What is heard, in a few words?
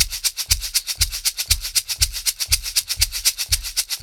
EASY SHAK, Roots Disco; USB Soundscan vol.32; AKAI